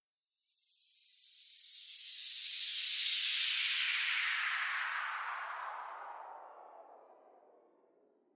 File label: Metal Shimmer Whoosh Sweep Downwards Long; Sound Design Title Sfx; Whoosh Sound Effects